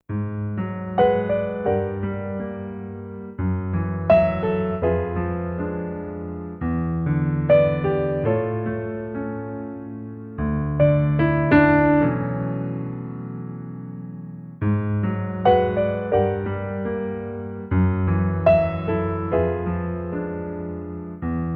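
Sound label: backing track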